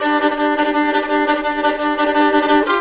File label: violin, fiddle; zombat